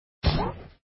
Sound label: shoot